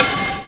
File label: Bell Tambo FX; One Shots; Drums; Ableton Live 12 Lite; Core Library